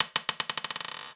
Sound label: Marble Bounce; Balls